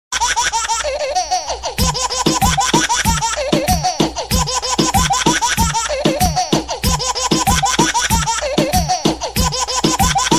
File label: baby laughing remix